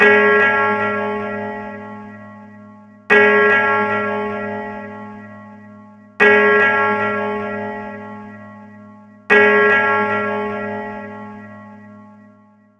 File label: bell; ze venice